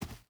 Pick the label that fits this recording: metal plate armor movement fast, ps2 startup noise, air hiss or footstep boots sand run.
footstep boots sand run